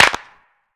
clap single hit e key, Royality free clap tuned to the E note. Loudest frequency: 2296Hz; percussion; single hits one shots